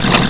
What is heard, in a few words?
grapple fly air; lmctf